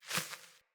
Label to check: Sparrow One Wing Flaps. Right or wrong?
Right